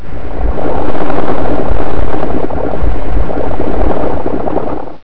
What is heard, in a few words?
Water, 水声; 特酷声音素材库